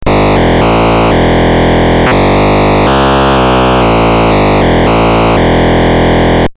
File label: squeezebox